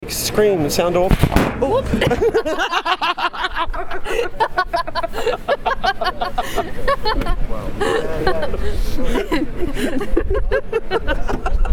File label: laugh end